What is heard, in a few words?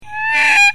crow